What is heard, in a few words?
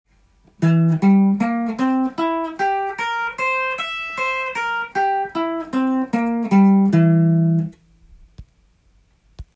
c7 chord tone, guitar